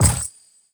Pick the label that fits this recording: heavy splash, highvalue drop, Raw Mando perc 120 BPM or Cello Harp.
highvalue drop